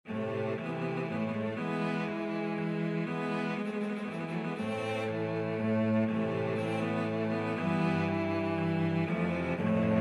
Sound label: cello quartet